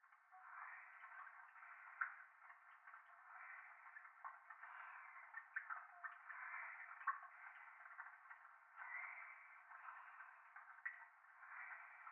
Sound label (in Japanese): トイレ 右耳 密着 吐息 雨上がり